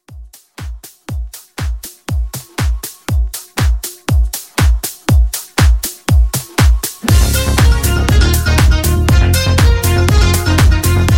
80s House Re Drum 120

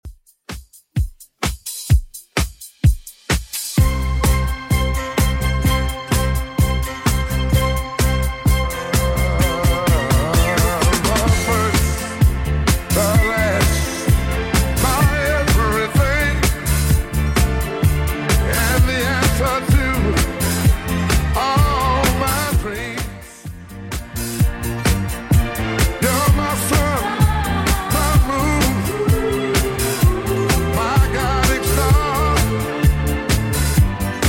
70s Redrum 128 bpm

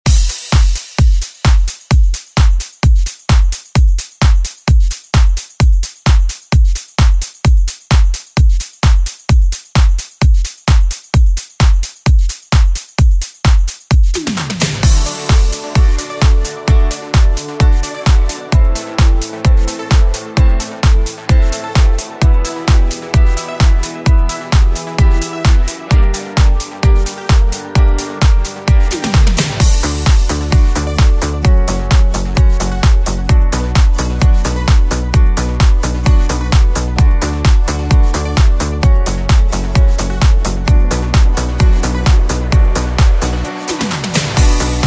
Dance Bootleg; Deejay Hit

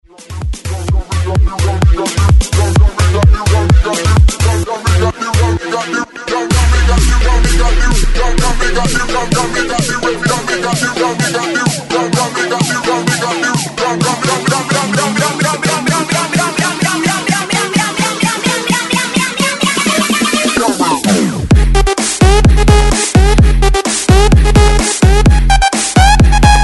130 BREAKMIX

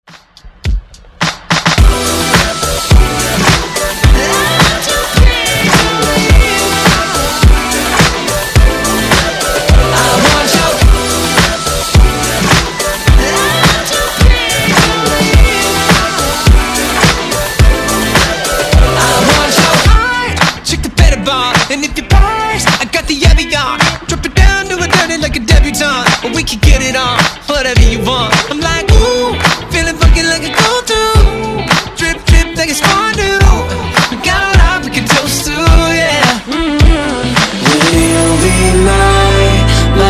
Top40 Redrum

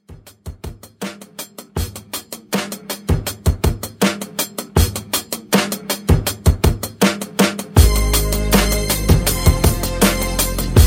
Hip Hop Rap Redrum; Remixfreakz

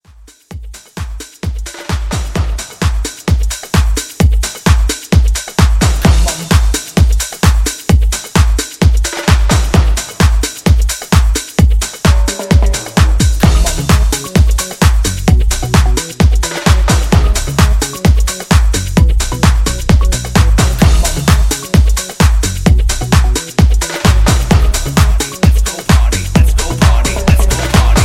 90s Extended Re Drum 130

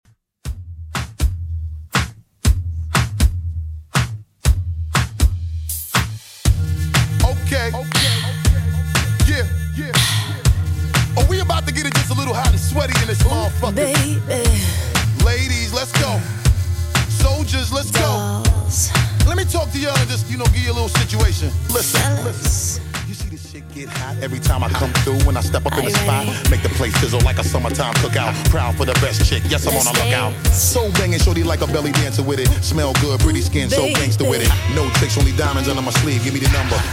2ks Redrum 120bpm; remixplanet

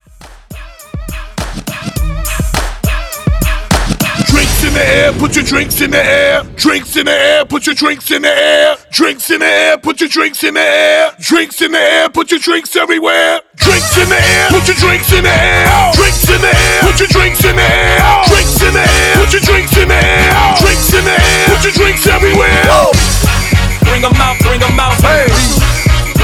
Party Booster Hype Edit 103 BPM

